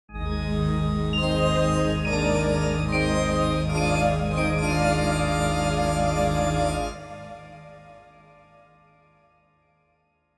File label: Video game success victory win space; Video Game Success Sounds